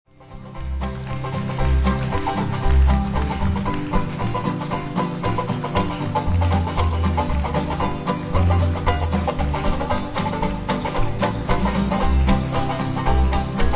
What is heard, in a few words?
old time banjo medley